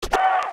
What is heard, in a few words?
Hiphop leave application grid; Nokia E72; Audio themes